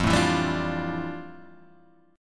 F Major 13th Guitar Drop D

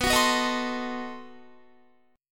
B 7th Flat 9th B Guitar; B7b9; strummed

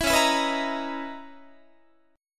Db Minor 13th Db Guitar; Dbm13 strummed; JGuitar

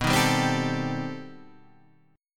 B Augmented 7th Flat 9th B; strummed; B+7b9; 1,3,sharp5,b7,b9; JGuitar

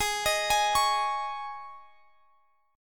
D sharp 7th Suspended 4th G sharp, guitar

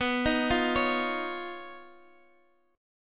D sharp 7th Suspended 2nd Sharp 5th

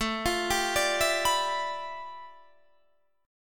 G 13th A, chord; G13/A arpeggiated

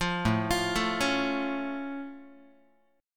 A Augmented A down Guitar; arpeggiated; 8,5,8,7,5,x; JGuitar

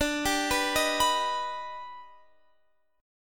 D sharp Augmented Major 7th D, chord

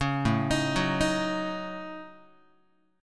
A 6th Flat 5th A Guitar, chord; A6b5; arpeggiated; JGuitar

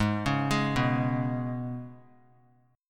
Ab Minor Double Flat 5th down; Abmbb5; arpeggiated

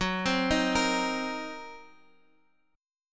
F sharp 6th Flat 5th, down

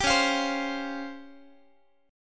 C sharp Major 7th Flat 5th Guitar; C#M7b5; strummed

